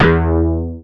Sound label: BAS TX-802; Best Service Gigapack 2